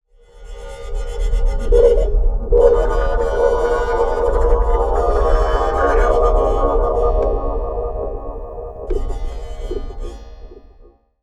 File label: Moog LPF & Delay, contact mic, Wire & Tin